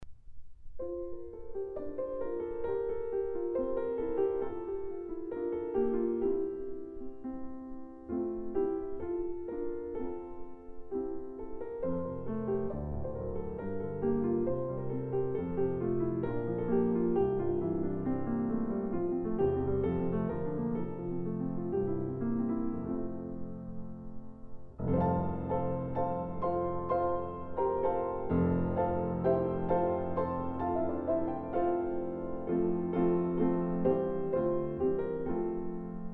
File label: improv Christmas Angels We have heard on High